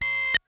tone clusters bursts of high pitch beeps ui; prosoundfx

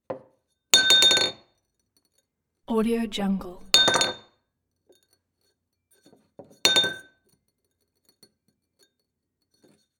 glass spill fall on table; collanderson

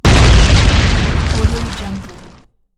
explosion

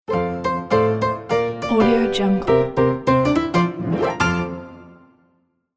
funny blues logo

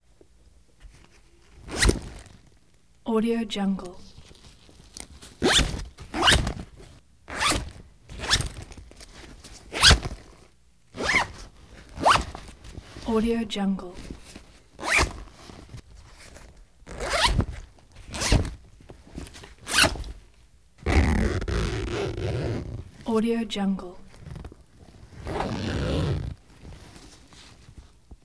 zipper

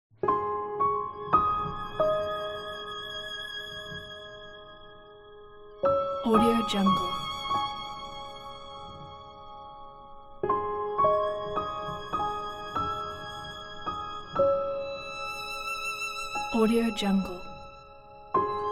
gentle lullaby

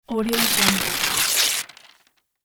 slow paper tear; sound-ideas

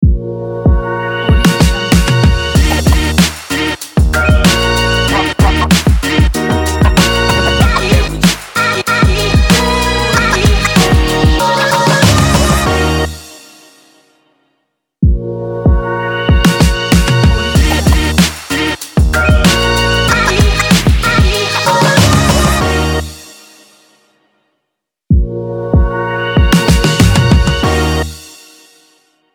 tv show logo

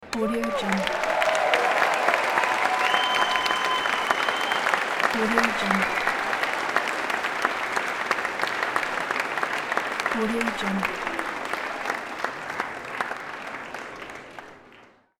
small crowd cheers and whistling; ikosonik

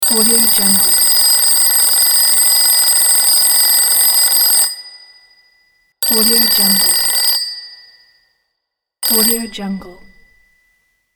alarm clock

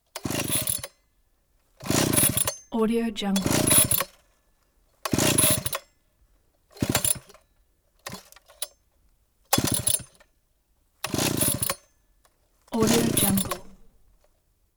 chainsaw pull string

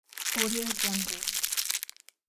crumple paper

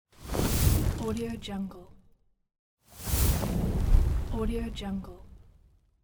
blowing air into fire

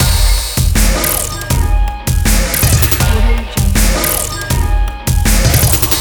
80 bpm extra loops; soundmorph

